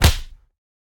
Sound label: Dash Slam Impact; boomerang fu